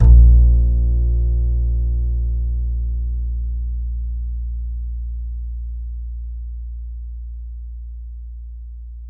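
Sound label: acoustic bass soft A#1 left; Masterbits CLIMAX 7 - Bass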